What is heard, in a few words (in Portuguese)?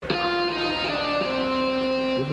notificacao; morumbis spfc